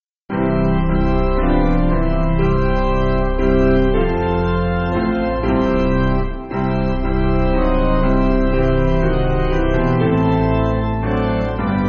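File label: Piano, Backing Files for Churches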